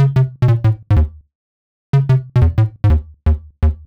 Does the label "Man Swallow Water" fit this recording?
No